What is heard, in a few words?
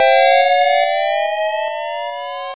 Warp; fire and brimstone amiga